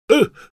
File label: pain sharp, es; Engineer